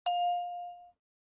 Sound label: OS Sound (Speaker-Notification)